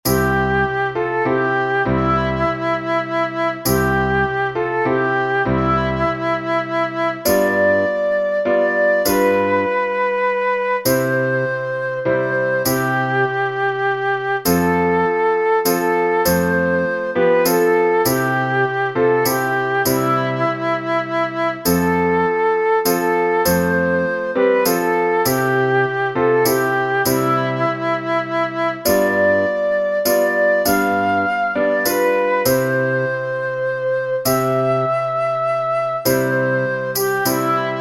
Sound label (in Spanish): Noche de Paz, Flauta Violin Oboe